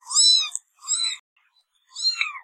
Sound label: kitty